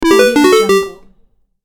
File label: old school melodic game phrase